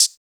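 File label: vox percussion, Vocal Planet Jazz FX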